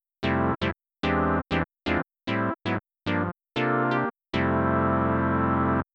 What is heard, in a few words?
filter; Wiwi Digital music technology